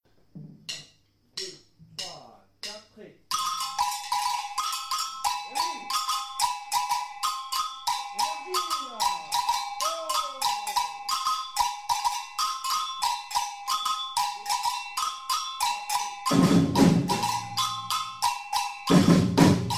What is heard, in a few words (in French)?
afoxe complet break intro et relance, batucada